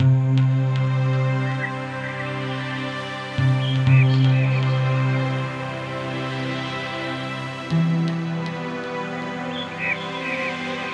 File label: music zen